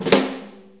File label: 4-4 Snare Flam; 4-4 Drum Loops; Flams; Drum Tools